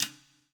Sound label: POWER RIM